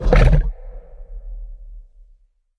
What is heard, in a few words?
run breath; smite; Anhur